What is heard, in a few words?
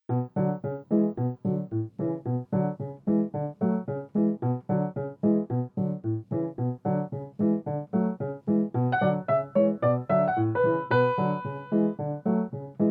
Left Hand Swing